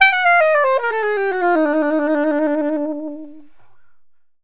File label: SAX, Balkanish Traditions